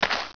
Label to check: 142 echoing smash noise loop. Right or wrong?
Wrong